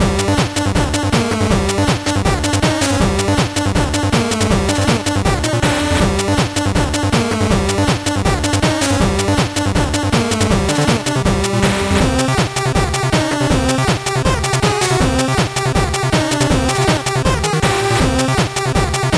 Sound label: 8bit bgm fast